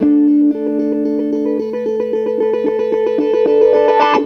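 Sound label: guitar, Roots Funk; USB Soundscan vol.41; AKAI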